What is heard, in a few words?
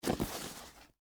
Boot Pick Up; Foley; Dressing; Blast Wave